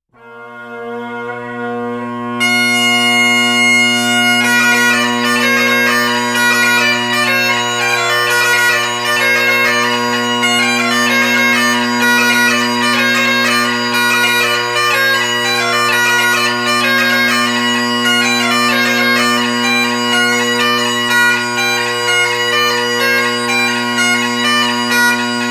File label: Pipers, Bagpipes; Glasgow City Police Pipers